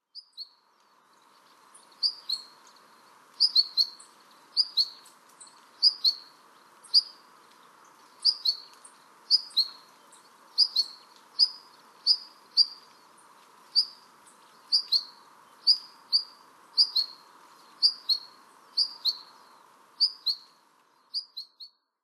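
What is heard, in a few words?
Long-tailed Rosefinch; benimashiko; watarase; koe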